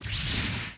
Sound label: enemy spawn; srw